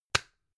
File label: gum plastic box close hard